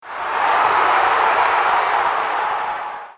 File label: Crowd Cheer